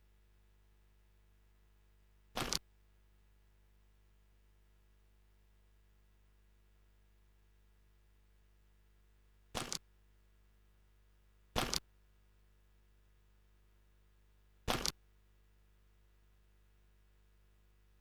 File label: stereo instr loop